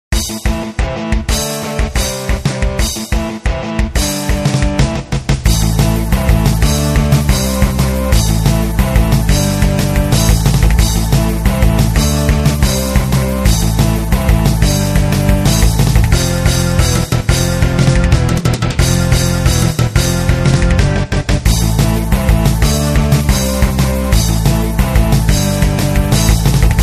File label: battle cave; Monster Pinball Ultra Flashy Tensile Battle Game; Chronus App Inc; Android